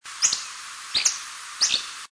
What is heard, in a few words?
song, Empidonax hammondii; Passeriformes; Tyrannidae; PNW